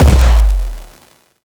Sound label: soundwave weapon scifi shot low; Bluezone Corporation